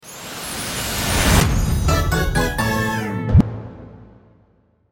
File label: Big Success; Cookie Run; Evolution Treasure